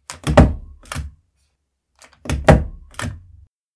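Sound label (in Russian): звук сшивания бумаги двукратный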